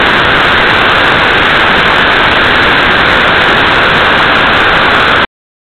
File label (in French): roulement